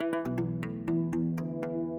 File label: chord E